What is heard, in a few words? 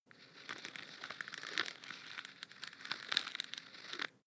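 floss pull out slow, bathroom; haus-garten; badezimmer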